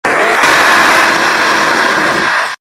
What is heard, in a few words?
scream; fleetway sonic